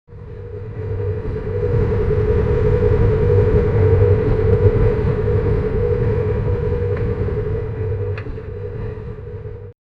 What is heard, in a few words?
create the sound of a, rails